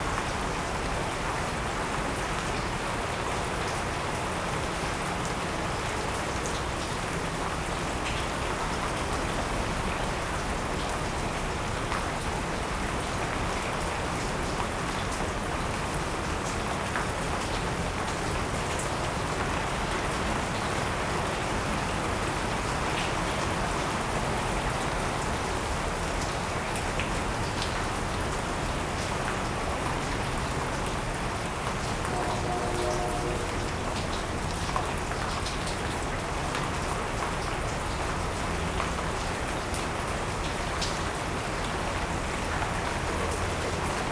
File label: Rain On The Carport, Field Recordings Oregon; Omni Gardens